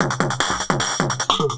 Throatbeat

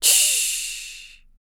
CYMBAL, MALE PERC

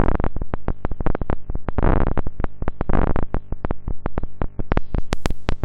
Geigervibe, Weird; Industrial

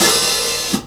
HH Op-Cl, Hi Hats; R&B Drums

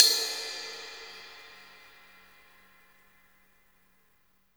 Drum Dry; East Collexion; Drum 1

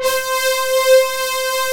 SWELL; Pro-Rec - Synthsonic; Z1 DANCE

